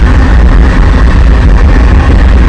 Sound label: engine; quake2; coop; slaughter